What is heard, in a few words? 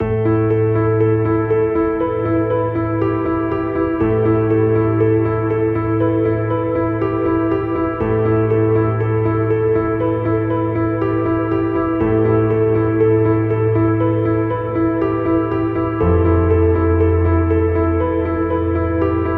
Mood Piano - Arpeggio - Am 60Bpm; Ghosthack; SkyRealm